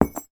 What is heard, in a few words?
household yale key drop on carpeted floor